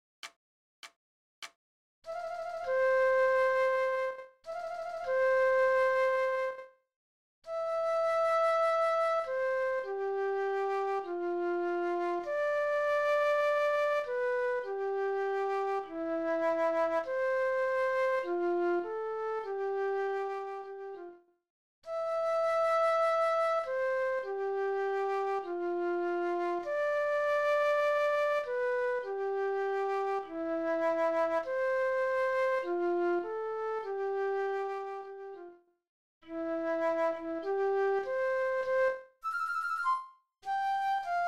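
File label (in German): Floete, 100 bpm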